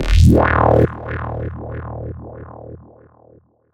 Squelch D# 142 BPM, Psytrance; Ghosthack; Psytrance Visions Volume 2

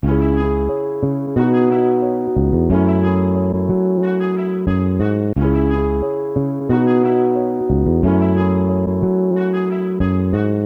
Classic Melody Loop 90 BPM C# Min, Melodics; Cymatics - Eternity Sample Pack

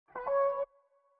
162 BPM C# Min Vocals; Melody; Melodies; Loop Stems; Cymatics; 2022 Melody Mystery Expansion; Feelings

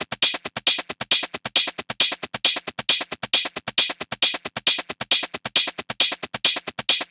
Hat Loop 135, Techno

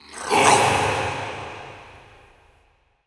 Processed Battle Shouts, Viking Vocal

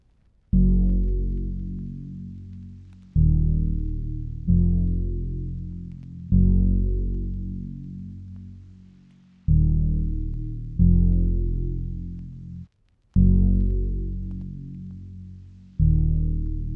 76 BPM A# Min Bass; Lofi; Melodies; Loop Stems; Cymatics; Lofi Starter Pack; Imagination

